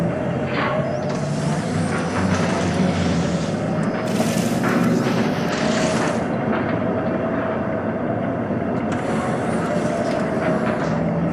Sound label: Robot Arm Track Slow Mid; Boomtown; Titanfall 2